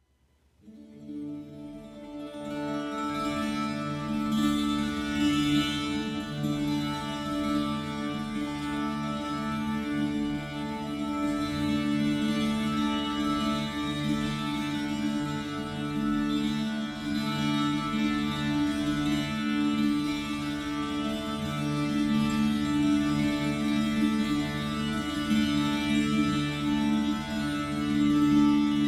Körpertambura gewölbt, Stimmung a-d’-d’-d (D), stetige Spielweise; Körpertambura mit gewölbter Decke; Körpertambura® (Body Tambura)

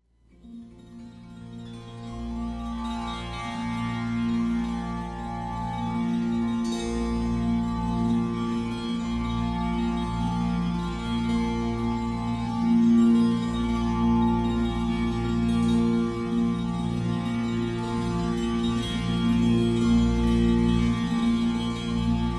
Körpertambura gewölbt, Stimmung f-b-b-B (BB), stetige Spielweise; Körpertambura® (Body Tambura)

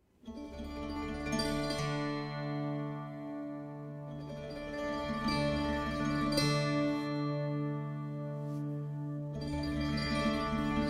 Körpertambura gewölbt, Stimmung a-d’-d’-d (D), dynamische Spielweise; Tamburina; Körpertambura® (Body Tambura)

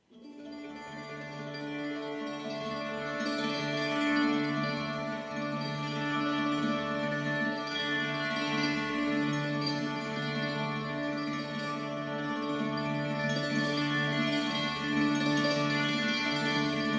Tamburina D stetig; Körpertambura® (Body Tambura)

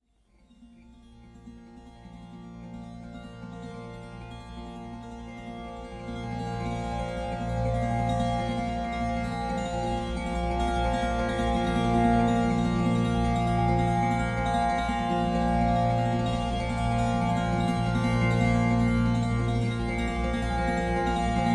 Koerpertambura gewoelbt Stimmung

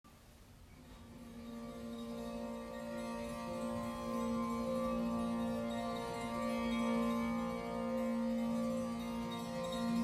Tamburina und Körpertambura

